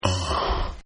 Sigh UHN; Exhaling; Voice; Partners In Rhyme

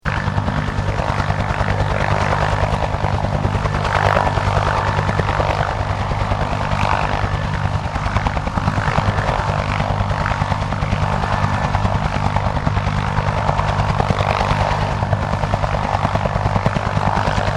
HELICOPTER 1967 BELL UH1 IROQUIOS EXTENDED HOVER; RAN UH-1 Iroguios; Helicopters; Vehicles

